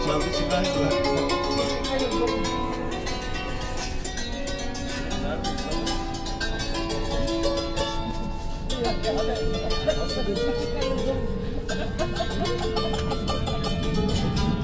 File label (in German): hackbrett in berliner u bahn